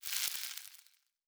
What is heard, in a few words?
Sizzle; Survival Game Sounds - Lite Edition; Environment